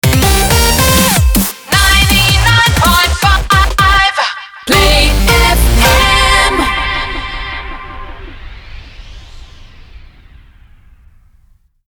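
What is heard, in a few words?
160bpm C minor Shotgun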